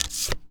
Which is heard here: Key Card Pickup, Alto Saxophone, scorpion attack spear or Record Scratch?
Key Card Pickup